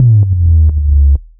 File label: Syn Bass; Equipped Music